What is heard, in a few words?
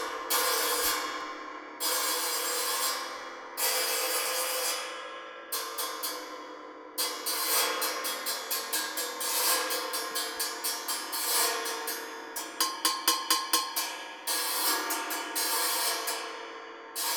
reco reco; pete engelhart